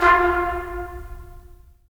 BRS, Tps Stacc; Roland - LA Composer vol. 4

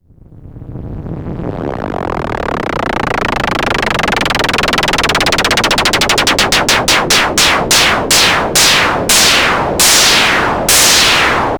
MiniBrute, Filtered Noise; noise hiss crackle

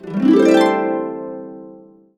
C, Harps; USB Soundscan vol.62; AKAI

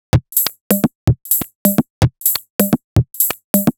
Synth whisperer 127bpm, House Drumloops; 90 s Sample CDs; Zero-G House Fabrik Drumloops; ZG Drumloops

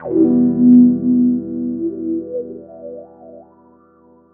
GUI CHORD; EastWest; Dance Mega Hip Hop Electro

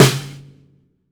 12 SNARE, MAPLE SNARE

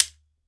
SHEKERE, Drums&Percussion

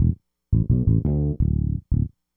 bass, Breakdance 172 Bmin, Hardcore Breakbeat; Big Fish Audio; Hardcore Breakbeat Action

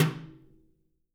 BRUSHES, Living Drums

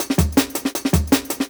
Jungle Breaks; Original Jungle Breaks

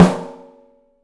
OPEN STEEL SN; 3D Drums

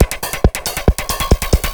138, Techno, drum loops

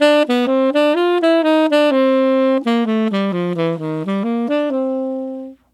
Tenor Jazz Waltz in C min, Tenor Sax Loops; Sonic Foundry (Sony Creative Software); Jazz Solos and Sections

